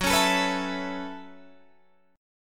F sharp Minor 11th, Guitar, Drop D

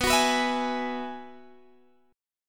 F sharp 7th Suspended 2nd Suspended 4th, B

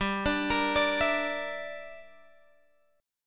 G Minor 6th, Guitar, Open F; arpeggiated; Gm6; 19,21,22,21,19,x

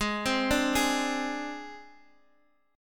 Ab Major Flat 5th Ab, Guitar Half Step Down; arpeggiated

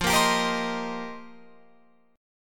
F 7th Suspended 2nd Suspended 4th F Guitar Open F; F7sus2sus4; strummed; 17,17,19,17,18,19

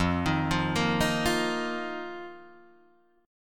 F 7th Suspended 4th F, Guitar, DADGAD

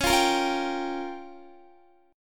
C sharp 6th Flat 5th C sharp down Guitar; strummed; C#6b5; JGuitar

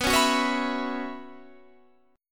B Suspended 2nd Suspended 4th, Guitar, Standard; strummed; JGuitar

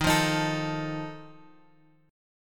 D sharp Suspended 2nd Flat 5th, chord; D#sus2b5 Chord {X 6 3 X 4 5} strummed

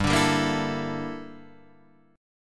G Minor 6th Add 9th G down Guitar Standard; Gm6add9; strummed; JGuitar

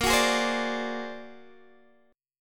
A sharp Augmented 9th A sharp Guitar DADGAD

